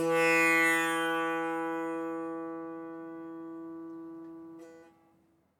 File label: Tambura